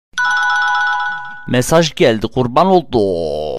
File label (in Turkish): Sesli Mesaj